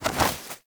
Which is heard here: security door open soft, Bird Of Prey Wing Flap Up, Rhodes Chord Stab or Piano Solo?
Bird Of Prey Wing Flap Up